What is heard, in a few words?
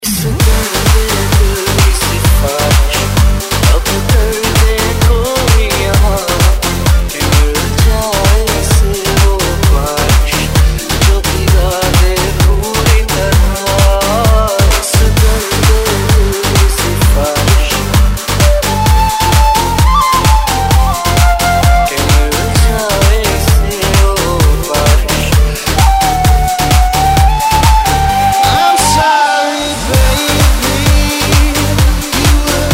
Flute Fusion remix